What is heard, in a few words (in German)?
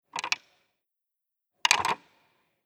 Kinder-Gedeck Grosser Loeffel rausnehmen einsetzen